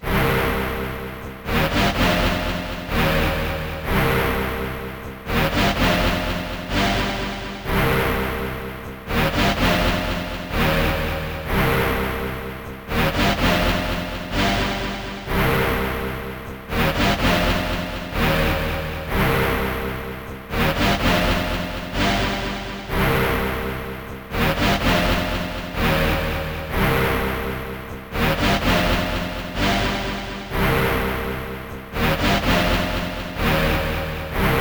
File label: rave sequence Cm 126; hardcore